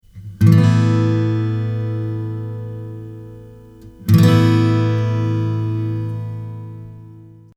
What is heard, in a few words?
Asus2